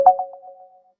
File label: notification